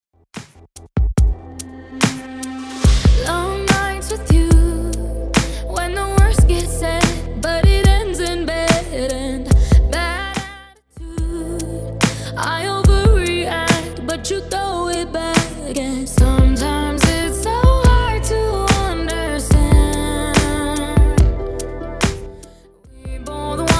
Top40 Redrum 72bpm

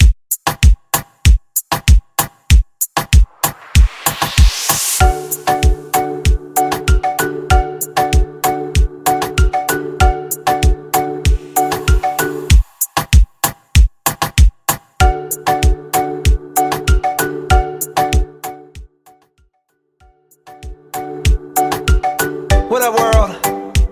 Top40 Pop Mixshow 96 Bpm; Re Drum Vegas Edit

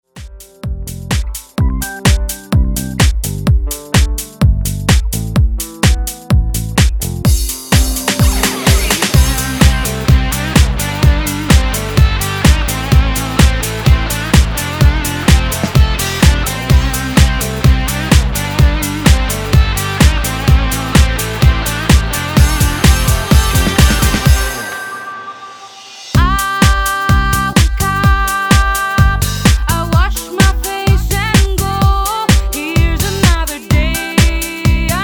2ks Dance Redrum Clean

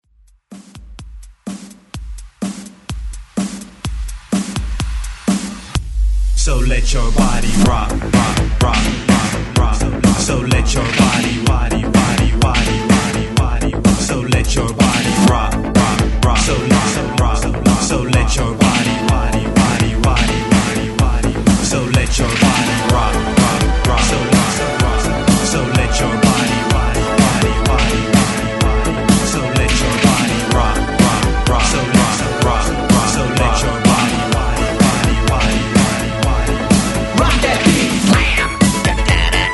ReDrum 126 BPM

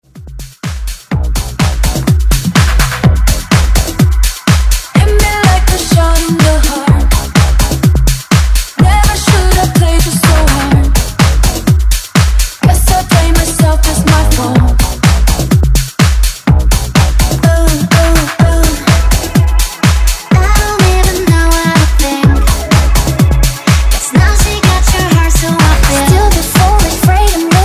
House Redrum Intro

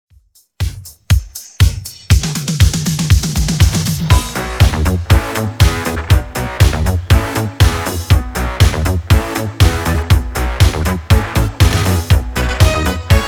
90s Redrum 120 Bpm

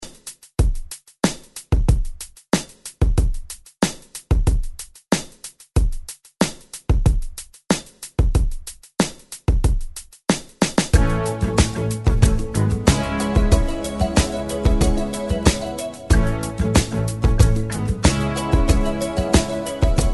Re Drum 93 Bpm; DJDOLLS

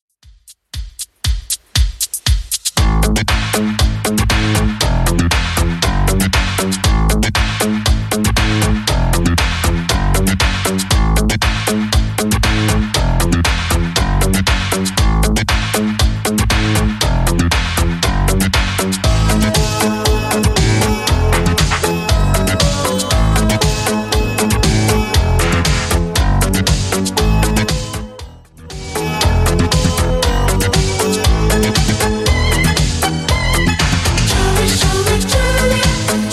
80s Redrum 118bpm

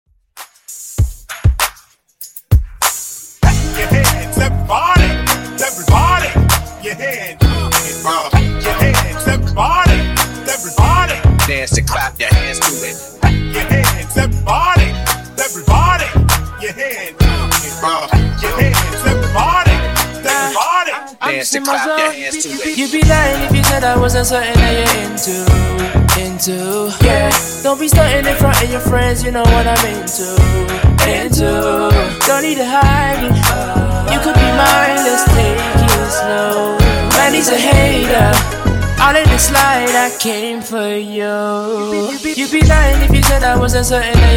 Funk Hype Dj Intro Outro Clean 98 Bpm

